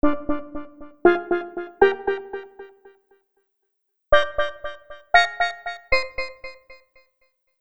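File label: Poly Synth Poly Chorus; Trip-Hop; UA Masterclass; Chord Chucks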